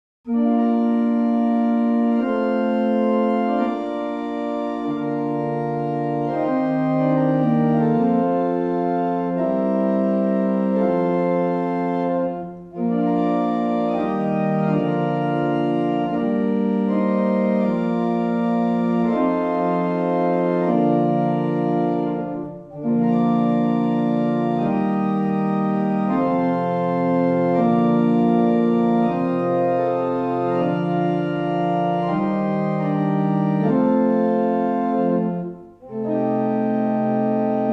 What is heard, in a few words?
barrel organ; Llanfair